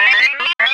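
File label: sphere blips large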